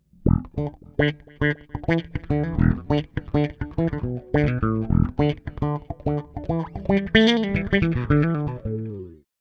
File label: GT-1B 70s Filter Funk